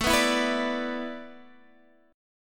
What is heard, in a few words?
A Suspended 2nd Suspended 4th, Guitar, Standard; Asus2sus4; strummed; x,12,9,9,12,10; JGuitar